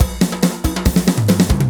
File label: Electro Fills